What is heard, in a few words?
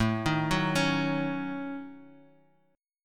A 6th Flat 5th, Bass Standard, A6b5 chord; arpeggiated; JGuitar